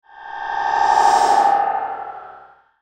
Horror Swish, Whoosh; horror sound effect; excellent sfx for scary videogames, and sinister halloween radio, tv, programmes, and creepy film produc

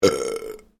MALE BURP MEDIUM; Male Vocal sounds; Burping; Human

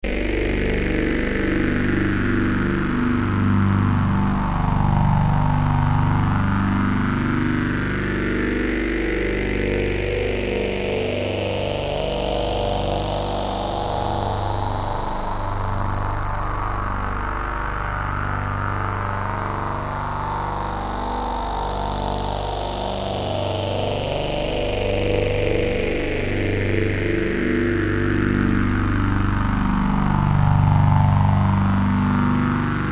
Drone Square Wave, Phaser, Slow; Production Element, Imaging Element, Accent, Transition; BlastWave

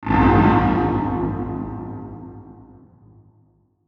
Stab Dark, Stab Dark Grind Delay; Stabs; Production Element Imaging Element Accent Transition; Blastwave

